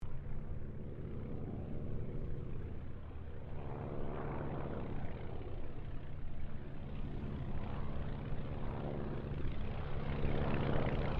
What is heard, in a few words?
AIRCRAFT RYAN PT22 PASS DISTANT; 1942; Airplanes (Sound Effects:Vehicles)